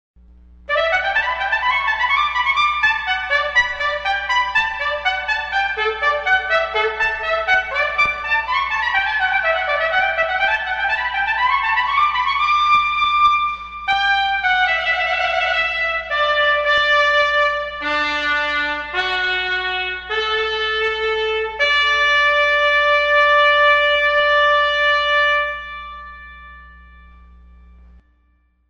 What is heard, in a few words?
Abblasen Fanfare; for 2 Trumpets; Fanfares; Gottfried Reiche